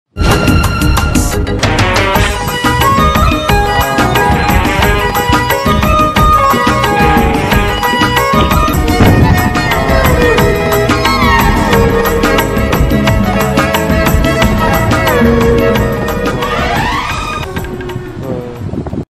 sad ringtone heart touching ringtone love ringtone